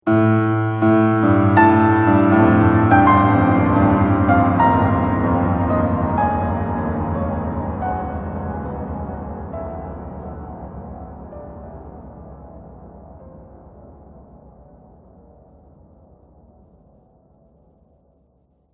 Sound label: Piano Horror Short Drone